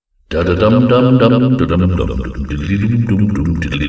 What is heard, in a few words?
sing dum dum; Fnaf 1; Foxy